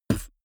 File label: beatbox bass drum